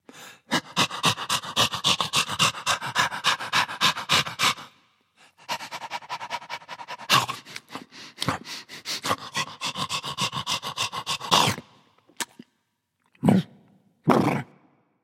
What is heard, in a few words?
misc snuffling; fenrir